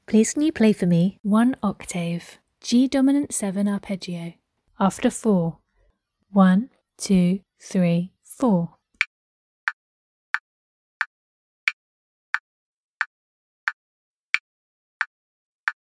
g dom7 arp

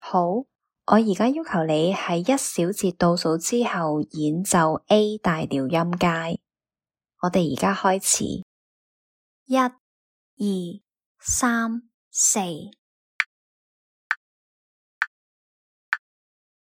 A major scale

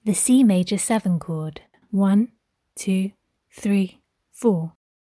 c maj 7 chord

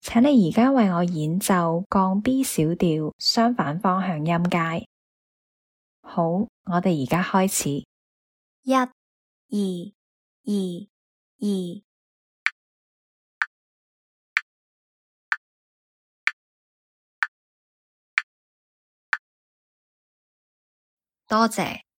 b flat min cont motion scale

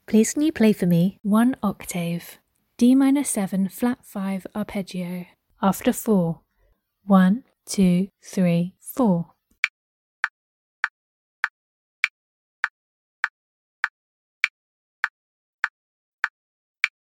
d min7b5 arp

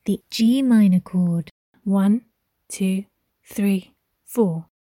g min chord

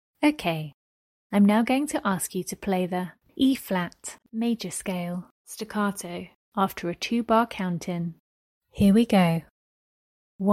e flat maj scale staccato

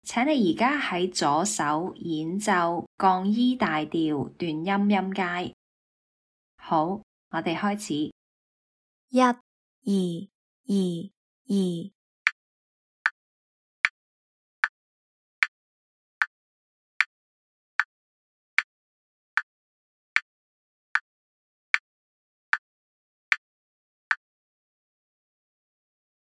e flat maj stac scale lh

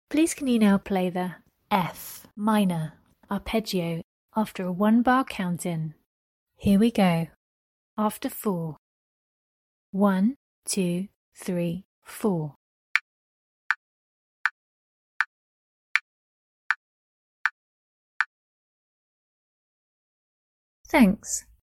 f min arp

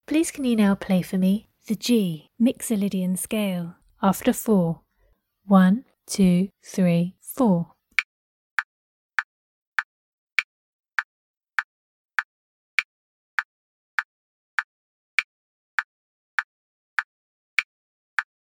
g mixolydian scale

